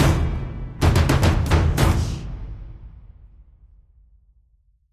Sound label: Victory Stinger; runic rampage